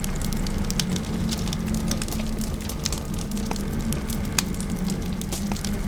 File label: fire; LoL; blue purple fire